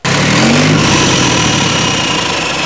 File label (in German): Leopard